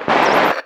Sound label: science, Radio